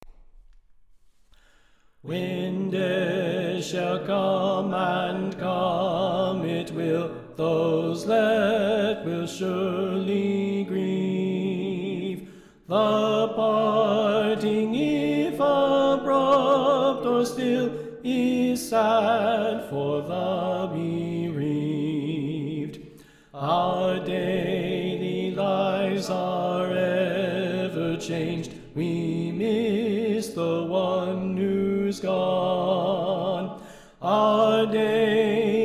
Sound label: Soprano